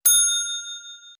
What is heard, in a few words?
bell ding; car locking